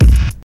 old school clean kick drum a sharp key, bass drum sound tuned to the A# note